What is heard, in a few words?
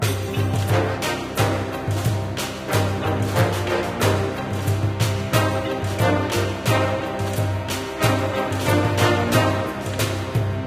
Pirate; Diner Dash Flo Through Time